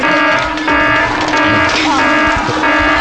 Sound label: Alarm, Game Show Sounds; Alarm buzzing that is heard when one of the panelists is caught doing the Secret Slime Action.; Slime Machine